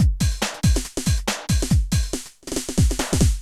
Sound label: techno 140